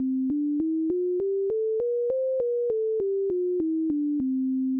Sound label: 24EDO Mohajira